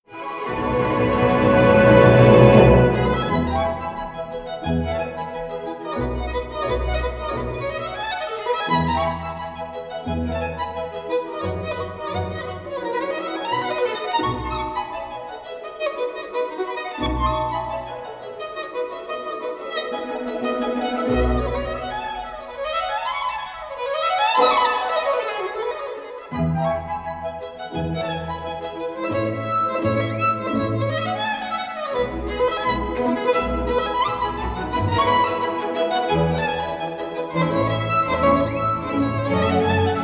Violin Concerto Excerpt; Mendelssohn

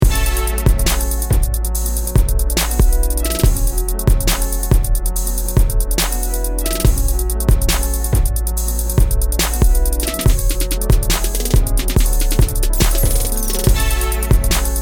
Bass Goes Boom Mix; iMaschine Expansions

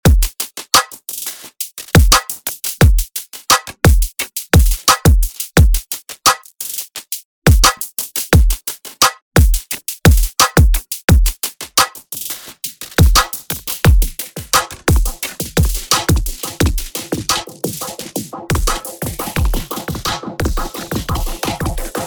Drums dry wet; Transit

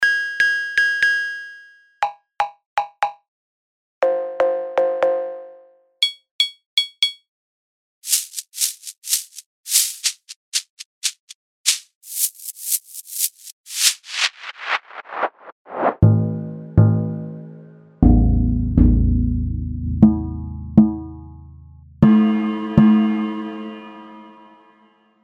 Solo Percussion Module; MASCHINE 2.0

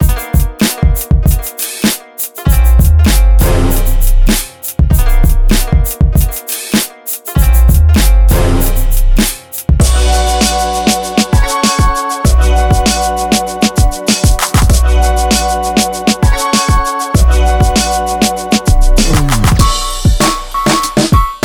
Urban Kits; maschine expansions; vintage heat

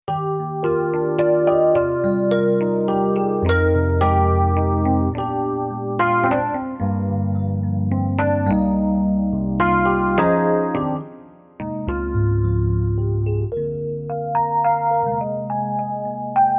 elektrik Kinda Ballad, elektrik piano

